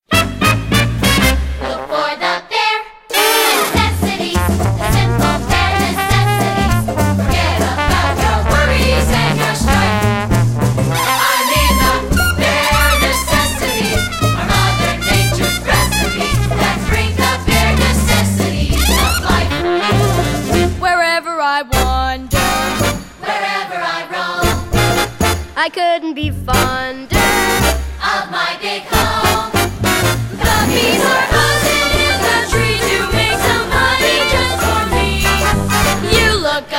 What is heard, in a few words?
Vocal Guide